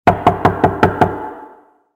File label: knock